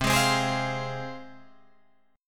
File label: C Suspended 4th Sharp 5th, Guitar, Drop D; down; chord shape